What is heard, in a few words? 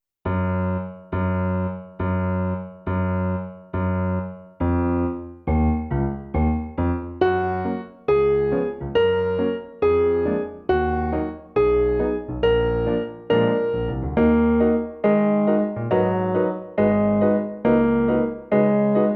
The Walking Song Slow